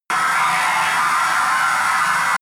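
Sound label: screech scrape reverb, Science Fiction; sciene-fiction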